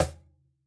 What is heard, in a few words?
Cajon hit f